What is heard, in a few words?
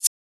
Reverse Hihat; trap; Hihats; Closed; Cymatics; Trap Starter Pack